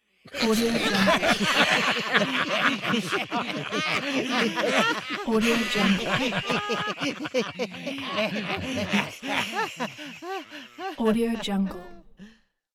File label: pirate crew laughter